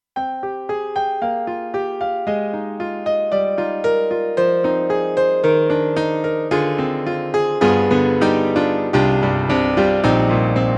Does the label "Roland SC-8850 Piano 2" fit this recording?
Yes